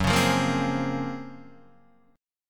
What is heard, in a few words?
Gb Major Sharp 11th, Guitar DADGAD; GbM#11 strummed